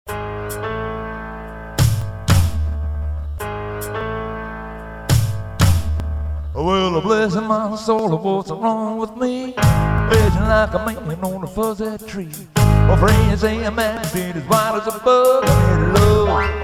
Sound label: Country Singer